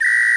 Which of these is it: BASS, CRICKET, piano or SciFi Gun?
CRICKET